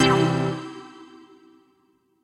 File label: intro Worlds; todee and todpee